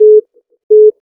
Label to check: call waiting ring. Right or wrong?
Right